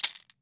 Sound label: maracas; Cuba